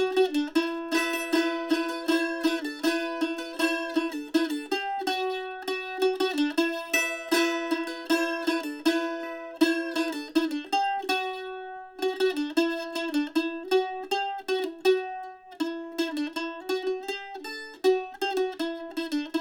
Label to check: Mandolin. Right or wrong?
Right